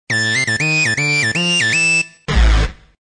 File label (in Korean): 엔딩; deepsky